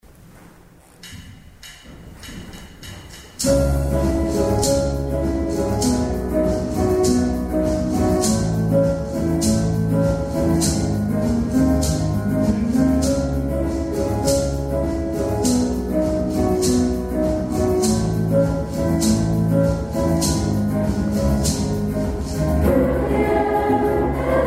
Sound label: kyrie eleison flamenco